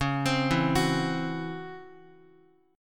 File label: C sharp Minor Major 11th C sharp Guitar; C#mM11 arpeggiated; x,x,1,5,4,2; JGuitar